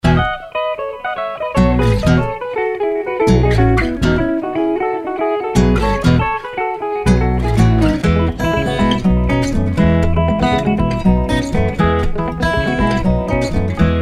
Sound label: Latin Guitar Ensemble